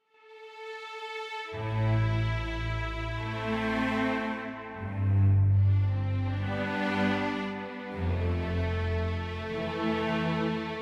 Scene Music; Age of Wushu; Windows

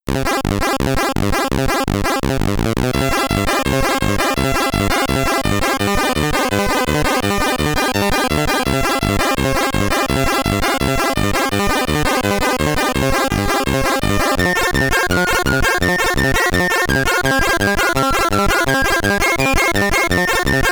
Bonus Level, SID Stereo; Forester; commodore 64

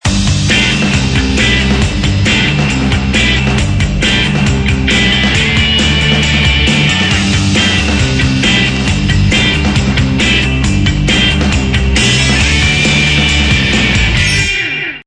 Win; Flying Metamorphosis Queen of SM Bar Fuate Co; android

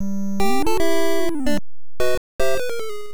Miss; Doraemon; Family Computer

